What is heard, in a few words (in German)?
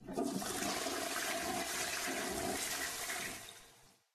toilette; minecraft